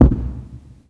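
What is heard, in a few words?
explosion distant; Call of Duty Modern Warfare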